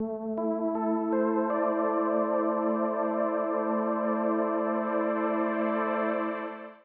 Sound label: SPACE SYNTH 140 A; SpaceSounds